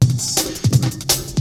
BREAKBEATS, TECHNO

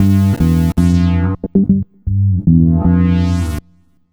E-Bass + Analog Synth

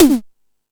Hip Hop, Drum Machines

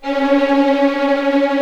C#4 TREM, VLNS TREMOLO, String; East West; The Ultimate String Collection

